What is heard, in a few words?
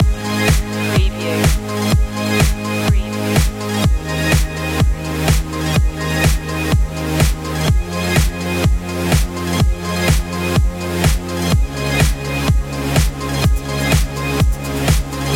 heartburst loop